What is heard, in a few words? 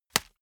small punch fleshy